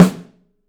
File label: Drums, STEEL; 3D Drums